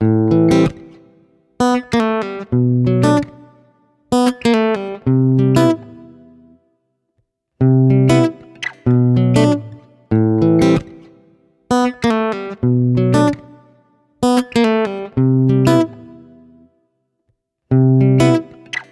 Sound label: Guitar 95 BPM (Amaj); Guitar Loops; Bravado; Ghosthack; Guitar Loops & Shots